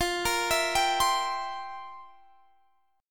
F Major 7th Suspended 2nd Suspended 4th, Guitar, Open G; down; chord shape